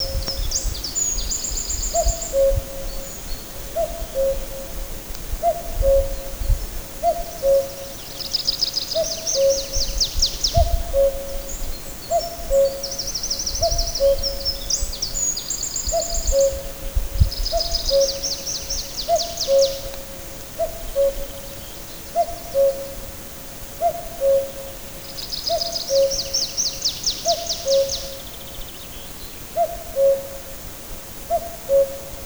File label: Common Cuckoo Cuculus canorus; Wicken Fen